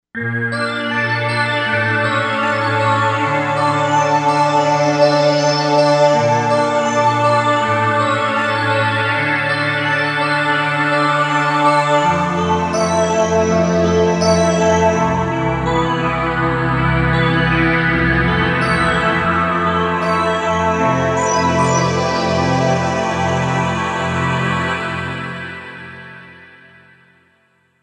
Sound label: Korg M1Rex; Factory Patches